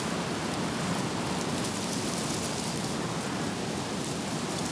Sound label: emitter wind in tree